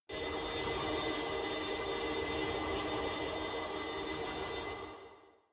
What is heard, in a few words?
space alien fabric working sawing high